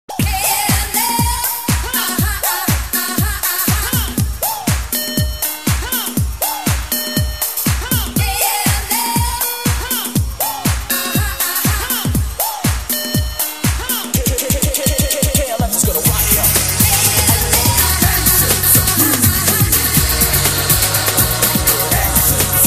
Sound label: dance classic beat